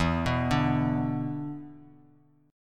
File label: B-Minor Double Flat 5th E